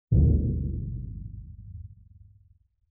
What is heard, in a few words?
Timpani Hit; Vintage Cartoon; Drums & Percussion